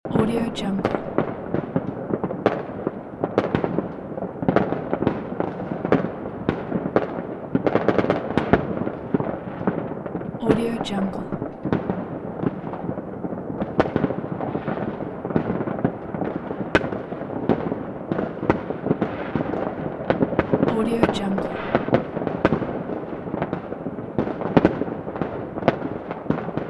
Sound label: new year fireworks distant ambience